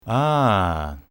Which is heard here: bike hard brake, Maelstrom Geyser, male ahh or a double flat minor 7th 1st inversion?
male ahh